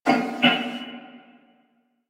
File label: Wolf Breath; Animals; DE; Warframe; Quests; Duviri